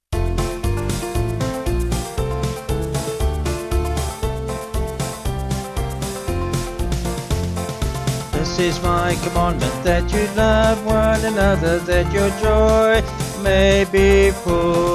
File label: Vocals Band; This Is My Commandment